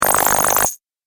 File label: Droid Drill Sound, robot sound effects; Sci-Fi Robot Sfx